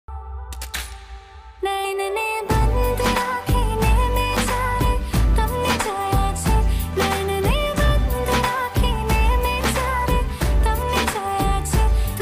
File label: song ringtone, love ringtone, sad ringtone